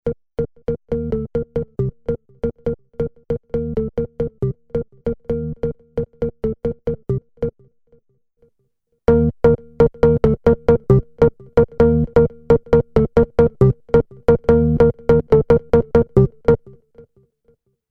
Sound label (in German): M1 Organ mit Tube